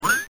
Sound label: catcall